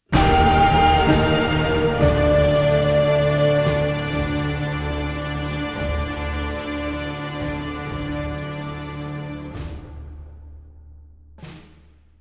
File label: Music Battle